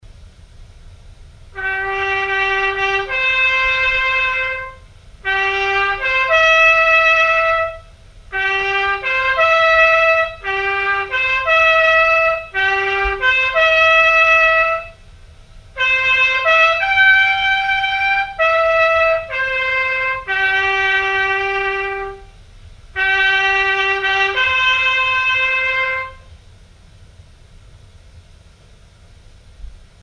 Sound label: taps